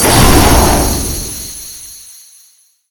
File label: salt